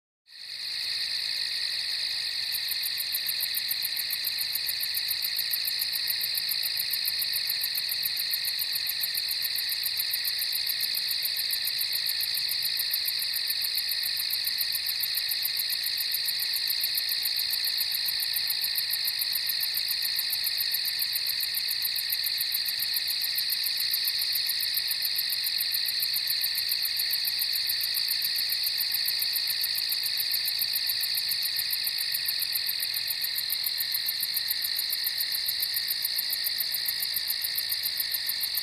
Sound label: Crickets at night on farm